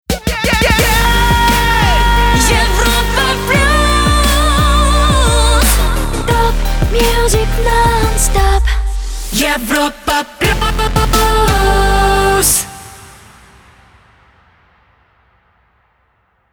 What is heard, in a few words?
Jingle; Europa Plus; Shotgun